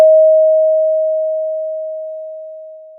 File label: pop, Sound of a doorbell